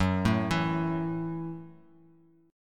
Gb Minor 7th down; arpeggiated; JGuitar

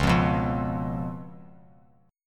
Db 7th Db down; Db7 strummed; JGuitar

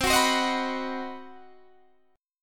C Minor Major 7th Flat 5th chord, Guitar Open D

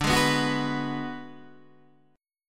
D 6th Flat 5th, Guitar DADGAD

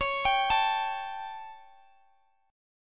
A 7th Db

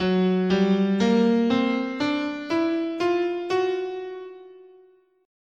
Gb Enigmatic, scale

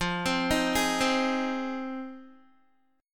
F Minor 7th Guitar; Fm7; arpeggiated; 12,15,15,13,12,x; 4F,4A,5C,5G,5C,6E

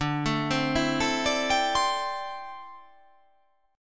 D 7th Suspended 2nd Suspended 4th D

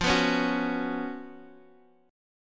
B Suspended 2nd F, chord; strummed; JGuitar

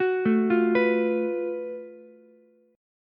F sharp Minor Major 11th, Ukulele; arpeggiated; JGuitar

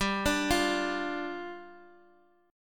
D Minor Double Flat 5th, G

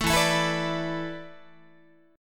E 7th Suspended 4th, Guitar; E7sus4; strummed

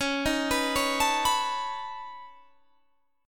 C sharp 7th Suspended 2nd Sharp 5th C sharp down

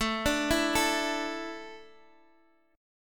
A Suspended 4th A, chord

